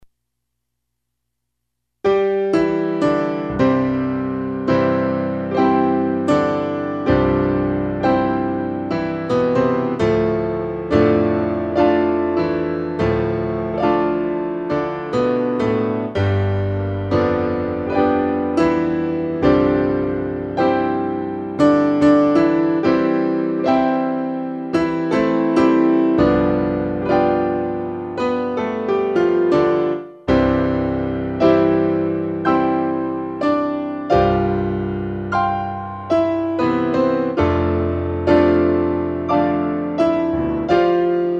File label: Piano Concerto